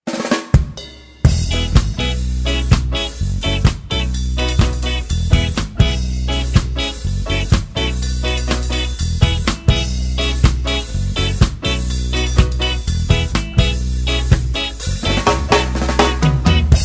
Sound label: Ska Dance